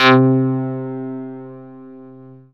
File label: BUZ, synthesizer bass; VFX-SD